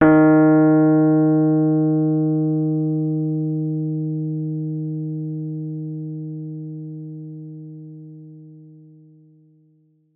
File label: Rhodes fortissimo, d#3; Session Instruments